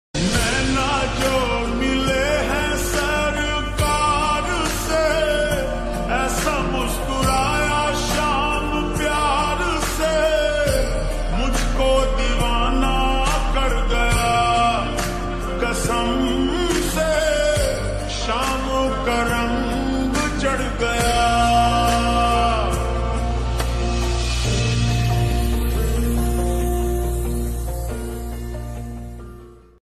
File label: ringtone slowed reverb